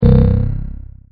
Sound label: ppg bass